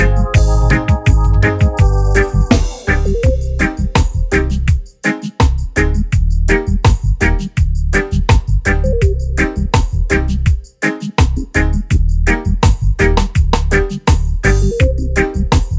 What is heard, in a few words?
Riddim Reggae